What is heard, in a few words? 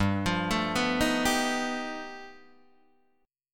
G Augmented 9th, Guitar, Open D